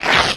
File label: claws; wesnoth